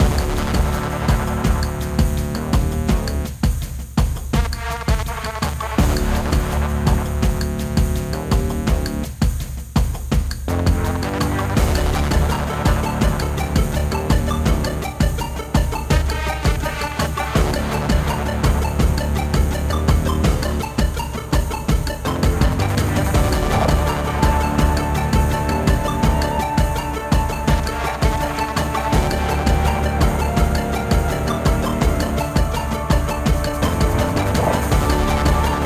Wasteland Battle Loop; Folk Hero

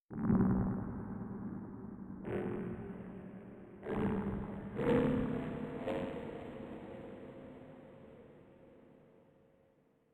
Music Hub Layer erosion disto; Music Hub Layer erosion disto 09

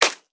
Monkey

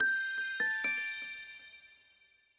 shard Bounce

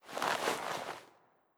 Hit Summon monster

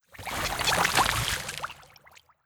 Skel Scientist Vomit

